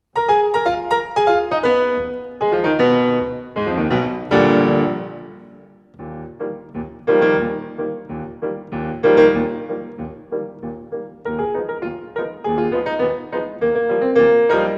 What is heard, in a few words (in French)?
défilé militaire piano